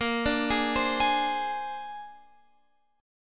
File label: A sharp 9th A sharp Guitar Open F; arpeggiated